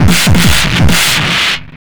MD 909 150, Hard & Loud Techno; USB Soundscan vol.01; AKAI

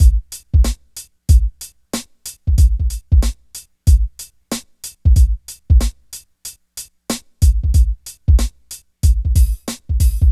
DRM, Hip Hop and R&B

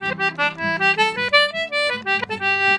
Celtic Flavours; USB Soundscan vol.42; AKAI

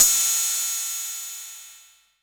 606 crash, TR-606; Drums In Your FACE!

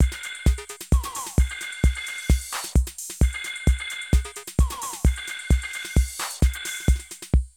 Drum loops, Euro techno

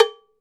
Cow Bell Hit; Sonic Foundry (Sony Creative Software); Joe Vitale Latin Percussion

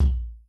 Kick, Yamaha RY20

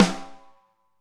Snare, Alesis DM5 Drum Machines

